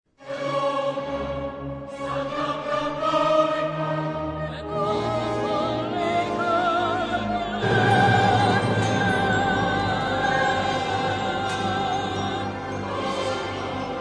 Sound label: Credo Fis moll